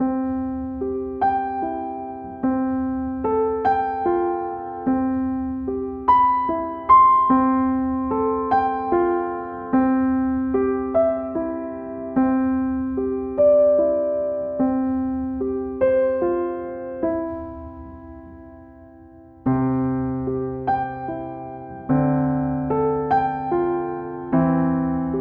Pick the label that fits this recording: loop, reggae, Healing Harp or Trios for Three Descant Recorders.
loop